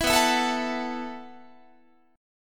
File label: B 7th Suspended 4th B down Guitar